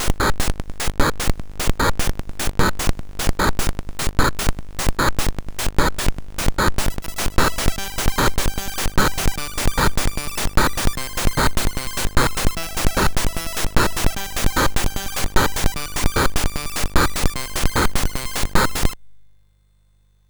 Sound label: Tension Remix, MOS6581